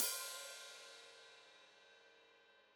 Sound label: Hat Foot Open, Hi-Hat; Deeply Sampled Drums